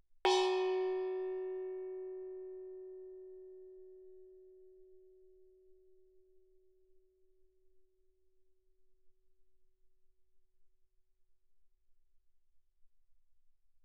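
thai gong Gb4 fortissimo, Percussion gongs & tamtams; thaigongs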